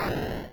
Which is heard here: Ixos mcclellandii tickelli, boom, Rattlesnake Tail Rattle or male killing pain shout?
boom